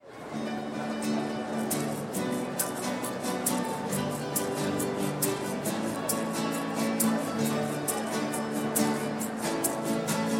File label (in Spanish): voces; misas 2012